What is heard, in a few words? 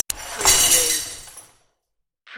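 Glass Dump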